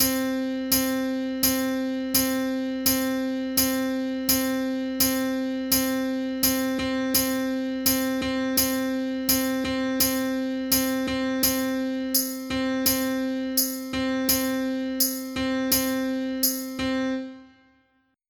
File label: rhythm; Music 101; Dotted Quarter Notes